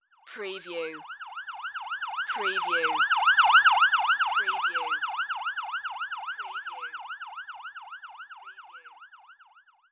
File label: Ambulance Siren Pass By